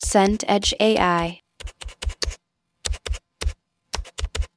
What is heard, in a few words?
typing slow